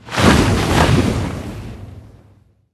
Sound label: Mage Overcharge; Ember Lords